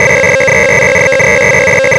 TAPE DUMP, Prophet Five; E-MU Formula 4000 Series Vol. 3; Analog Odyssey

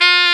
SAX XSAX, Saxophones; All Saxes

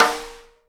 snare RIM RING, Live Snares

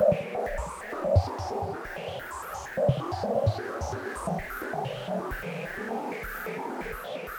Moving Noise 130, Tempo Loops 130bpm

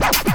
COMBI, Houseworx; Ueberschall; Workz-9

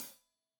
Hat Loose, Hi-Hat, Cymbals Stereo

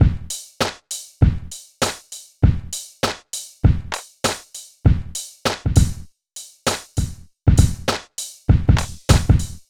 Drums, Hip Hop

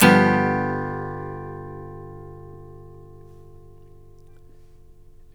Blues Guitar, chords

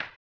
HAMBONE, Vocal Blues Soul; Spectrasonics; Planet

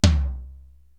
Tom Floor, Korg TR-Rack

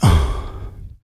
vox, Human Perc; Spectrasonics; Supreme Beats; World Dance

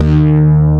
BASS, POWER SYN

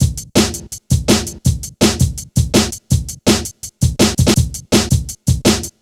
165, Drum Loops, Drum & Bass; Total Drum&Bass

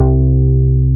BAS MKS-50, Roland

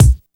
TAM KICK, Hip Hop; E-MU Formula 4000 Series Vol. 1; Hip Hop Nation

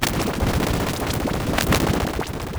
loop, techno atmos

